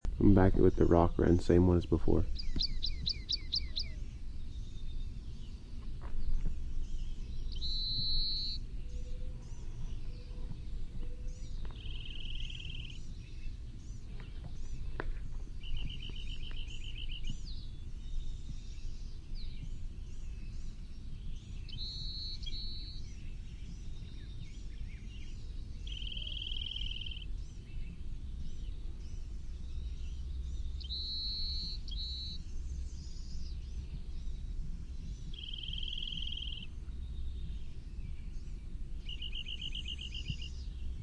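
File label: song, rock wren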